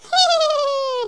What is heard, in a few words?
laugh; Worms2